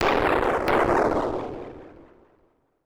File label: SPACE FX 140; SpaceSounds